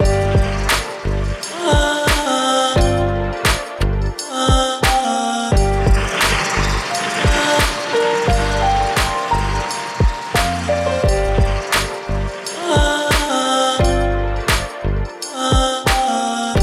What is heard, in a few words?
Ganges River Journey; yuttari